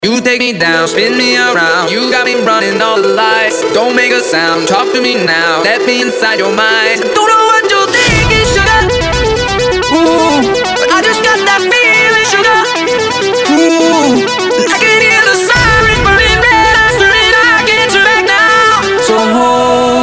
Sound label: dutch house mix